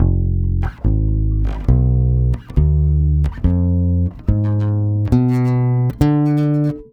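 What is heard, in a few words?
140 BASS F DIM, Brazil Bossa; USB Soundscan; AKAI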